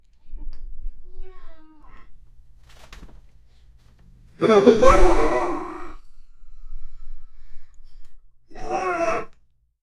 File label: dolphin sounds, chatter